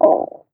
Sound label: groan; shweets sim